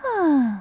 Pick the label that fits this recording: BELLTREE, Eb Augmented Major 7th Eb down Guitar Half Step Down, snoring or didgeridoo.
snoring